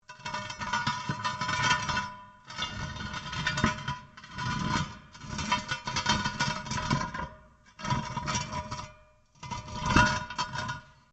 METAL ON STONE LARGE SCRAPE; Metal (Sound Effects:Materials)

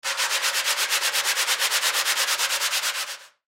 Sand Paper Scrub Wood Floor; Sander; Industry; Sanders; Machines

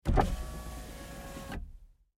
GMC Savana, Power Window, Down, Truck; Vehicles; 2010 GMC Savana; Trucks

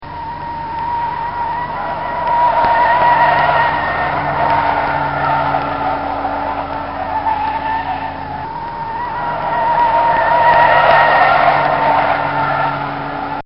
Fiat Swerving While Driving; Cars; Vehicles; Bobby Cole

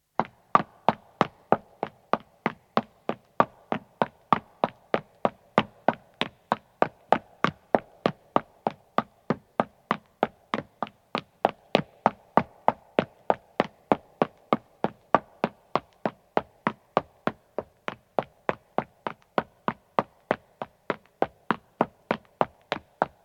Footstep stone run, 1 man starts and stops; BBC

